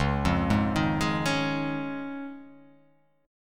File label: C sharp Major Add 9th